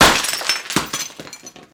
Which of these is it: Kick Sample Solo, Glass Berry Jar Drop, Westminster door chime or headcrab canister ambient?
Glass Berry Jar Drop